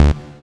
SYN POLY; Sound & Vision - Gigapack I CD 2; JD 800/990

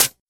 Closed HH

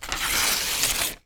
PAPER, TEARING PAPER; AKAI S6000 CD-ROM - Volume 6

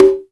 Tabla, Roland JX-305

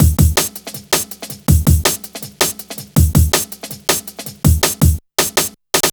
Drum Loops, Jungle, 162; Jungle Warfare 1&2

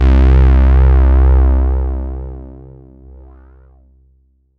C1, Synth Line; NiceBeats

